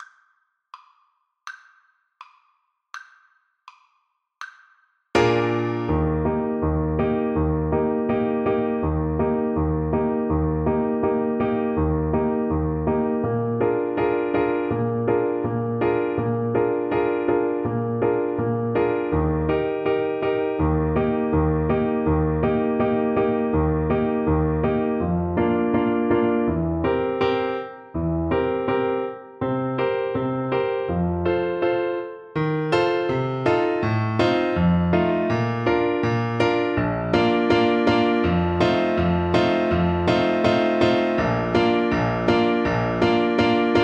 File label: horn, French Horn